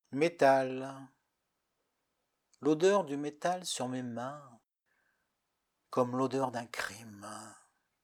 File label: METAL